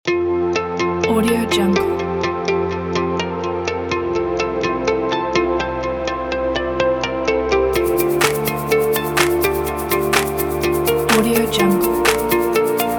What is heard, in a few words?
cheerful inspire upbeat corporate